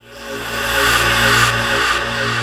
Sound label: Aura Rise; Jungle; Blu Mar Ten - Jungle Jungle - 1989 to 1999 Samplepack